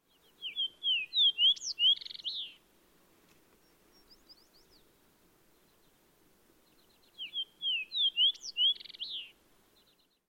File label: Fox Sparrow; Utukok R